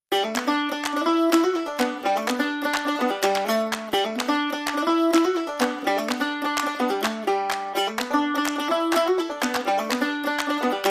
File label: Irish Shuffle, No Drums